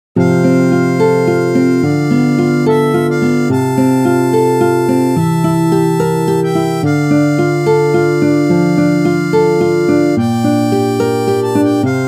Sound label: Harmonica; Ave Maria